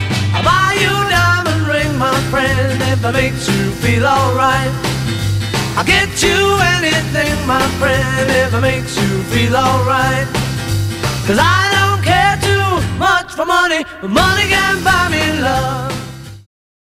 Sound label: 12 bar blues